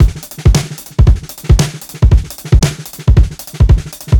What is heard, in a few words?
114 32ND FILL, DRUMS LOOPS; BASEMENT DRUMS VOL 2